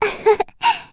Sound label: laugh; Eternal Return; Tia; Voice Line; KOR